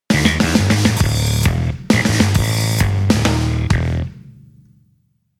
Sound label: Drum Bass